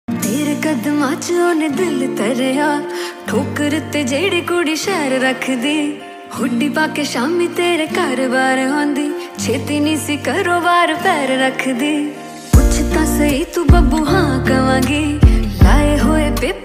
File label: Female Version Ringtone; ringtones